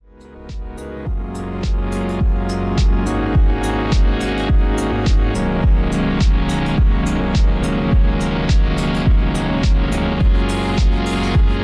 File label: slow ringtone, galaxy